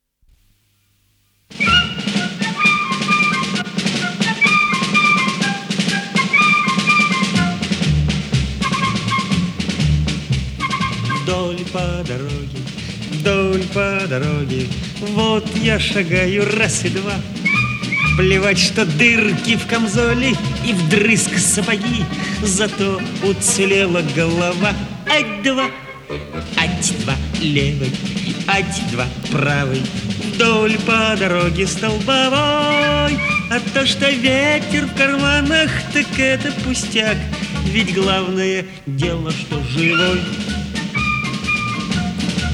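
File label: orchestra, mono; iz kf staraya staraya skazka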